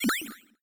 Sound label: pickup general; Crypt of the NecroDancer